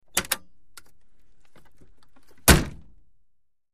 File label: Humvee Door Opens; door, truck